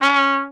Trumpet staccato C#3; Orchestral; DirectWave; Varazdin Orchestral

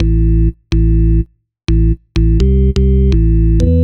DEEPHOUSE BASS 125 A

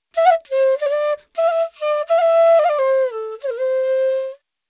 ETHNO EXTRA 90; ethno2

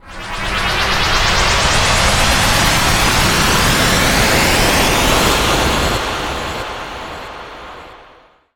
TECHNO FX 140

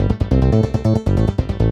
SNTH BASS ELCTR 140 A, Techno

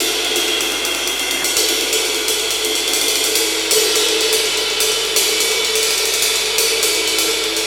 125 Classic Breaks, 125

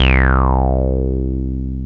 TB BASS; TB-303

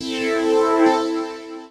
KEY SHOT TEKNO 140 A; Techno2; Singleshots

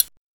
TRIANGEL; drum; PERCUSSION

